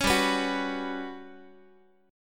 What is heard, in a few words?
G Major 7th Flat 5th, Guitar; GM7b5; strummed